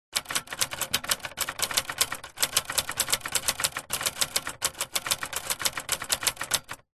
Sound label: office typewriter buttons keys presses typebar strikes typing sequence